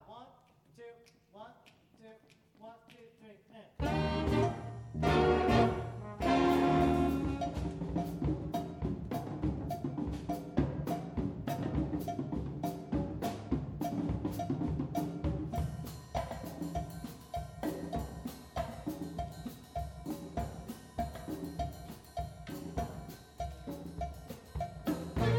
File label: mambo drum break to end